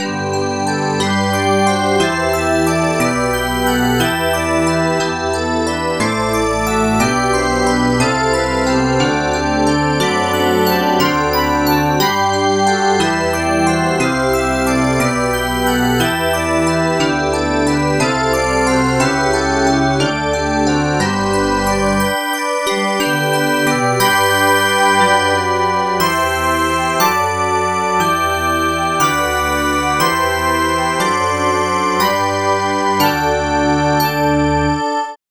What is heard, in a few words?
bowed glass